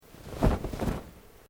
Clothing Kimono Sleeve Move; Movemement sounds for various articles of clothing; Dressing; Human

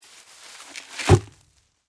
Foley Sand Pail Dump Bucket Upside Down; Playgrounds

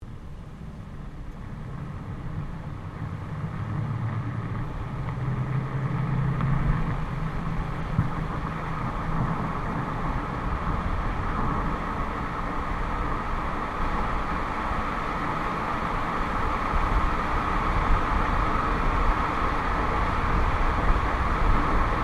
Car tyre noise accel decel; Cars (Sound Effects:Vehicles)

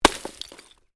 Ice: medium chunk of ice lands on hard surface

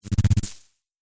Electric Discharge, Magnetic, Science Fiction; Blast Wave

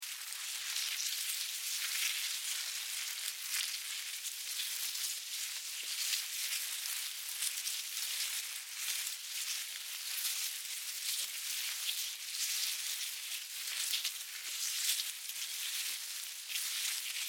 Ants, Colony, Bugs, Movement, Insects

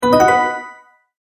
multimedia tone advance

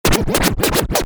Scratch, Record, DJ; Musical; Stuart Duffield

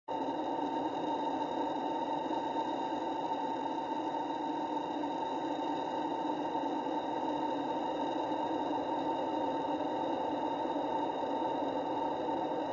Thrilling Breath, thriller-horror ambience sound; High quality thriller-horror ambience sound perfect for building tension and drama in film or game scene; Scary Soundscape

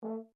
HORN A4 PP SHORT, French Horn in F; Alexander French Horn in F; Horns; Musical

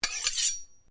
Blade Swish Low, Sword

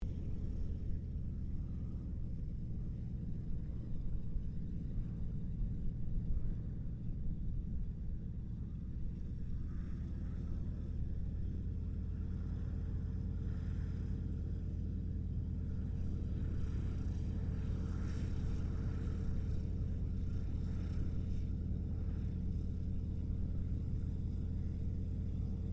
AIRCRAFT CA-13 BOOMERANG TAXI DISTANT; Airplane; Vehicles; Airplane Sound Effects

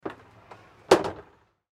Door Porta John Close Interior Perspective; Foley; Miscellaneous Door; Blastwave

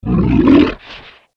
Monster Grunt, Horror, Monster, Grunt, Creature; Monsters

